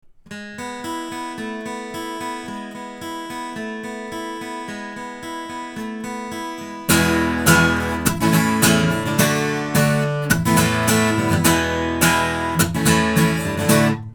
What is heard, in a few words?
sE Z5600a II omni guitar; se electronics